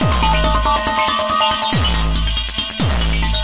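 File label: hardcore 1hit, 140 bpm; REMIXABLE COLLECTIONS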